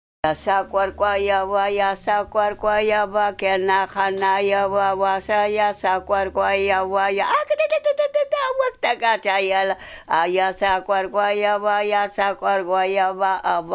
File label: kawashkar; musica y danza